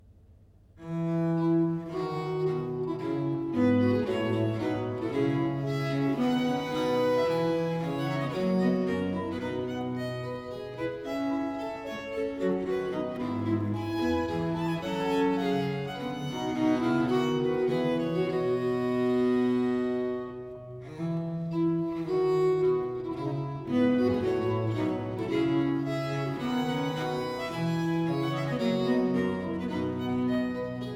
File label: suite no. 3 in four parts, in f major iii. ay, english music for viols